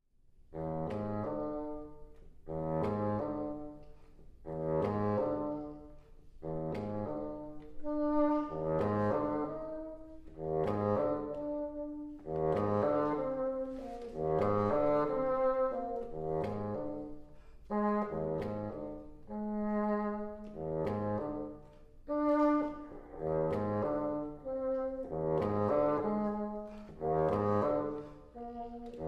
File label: Solo Bassoon; Lullaby; Love Songs